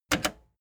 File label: plastic button slack click fast